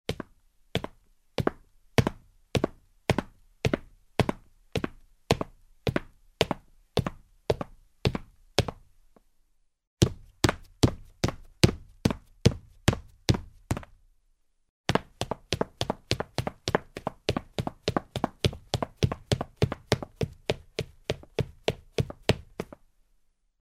Steps on a wooden ladder in shoes

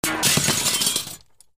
glass breaking

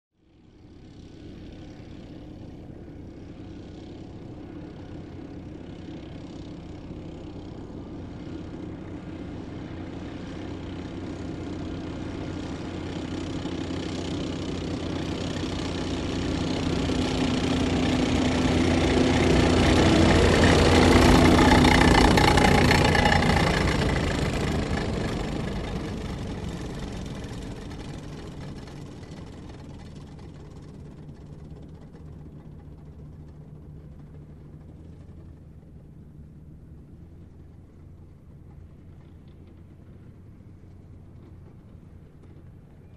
Tank passing by

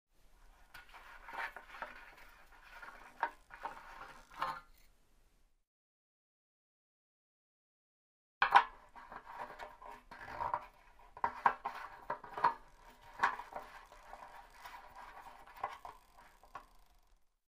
Motorcycle gas cap

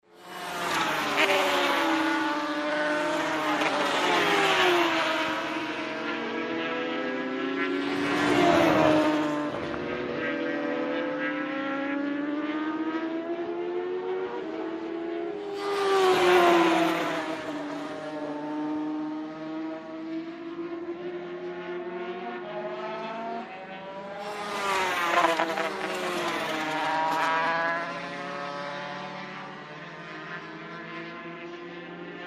Ring race motorcycles are passing by; motorcycle racing

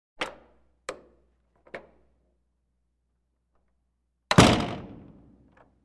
Metal door open, close

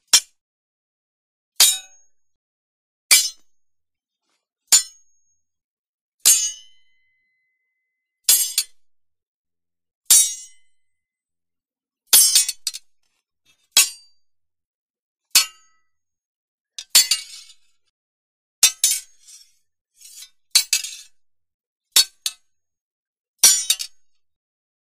Sword strike; cold weapons

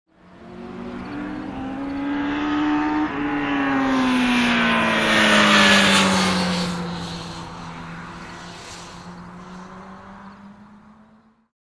Ring motorcycle passing by

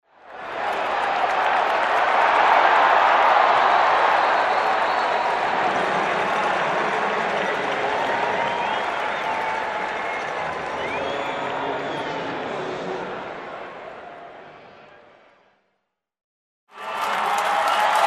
standing ovation stadium tribune

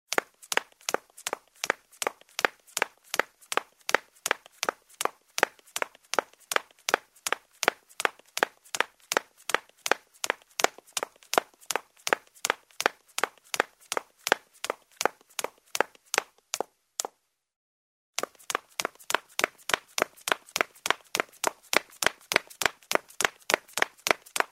Running on the marble floor in shoes; steps

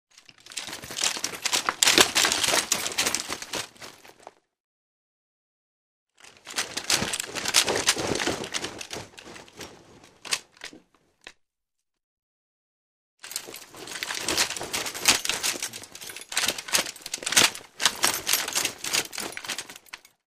Running, moving with weapons; steps

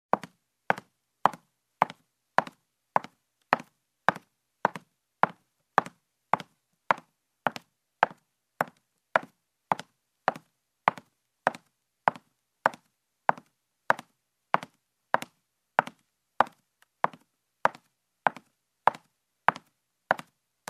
Steps on the wood floor in heels

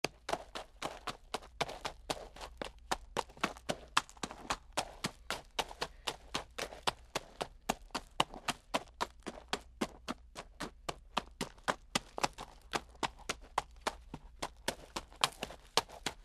Horse walking on gravel

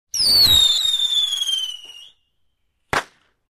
Fireworks shot, weak explosion

